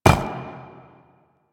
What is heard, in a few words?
Footstep; Warframe; Warframes; Qorvex